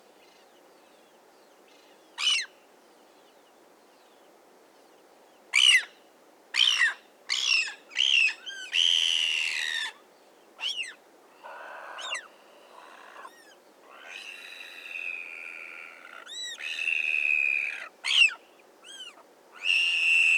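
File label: ivory gull; ismake; barentsoya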